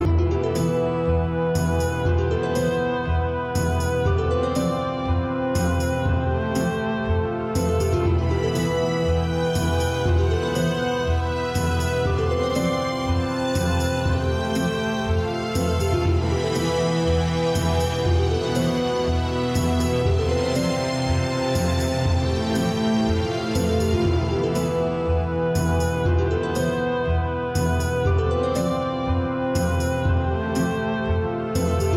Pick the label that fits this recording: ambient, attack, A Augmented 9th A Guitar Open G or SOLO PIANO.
ambient